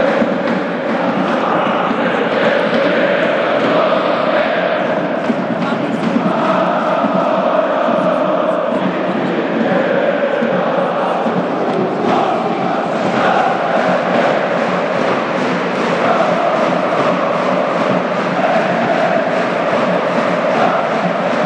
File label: stadium atmosphere poznan